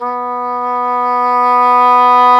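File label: WND English Horn, Swell; Roland L-CDX-03 Disk 1; Eng Horn Swl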